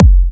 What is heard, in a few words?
Bassdrums; Vengeance Samples